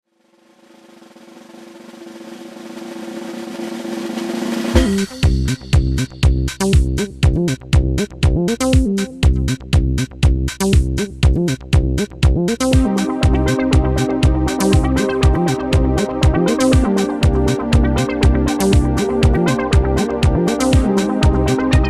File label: Techno